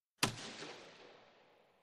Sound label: Hockey Puck Hits